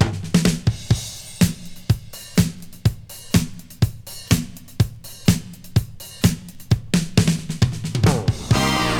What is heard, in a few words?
breaks; breaks06